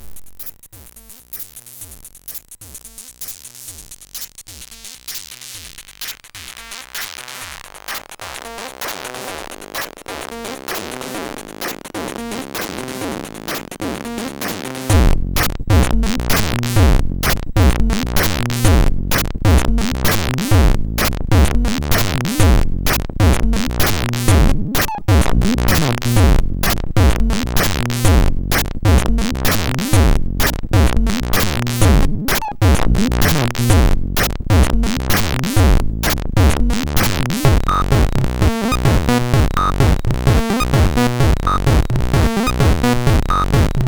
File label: Loop Song sid MOS6581R4